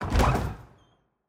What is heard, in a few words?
Basement Door Open